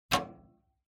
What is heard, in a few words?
PC Hardware Earphones Unplug